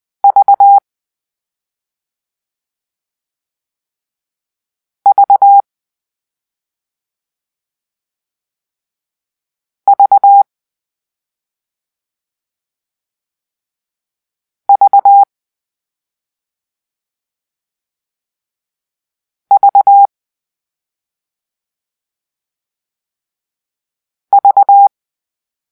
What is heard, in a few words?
Victor (V), morse code